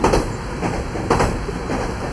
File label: Run 2, train; nankai6000; OpenBVE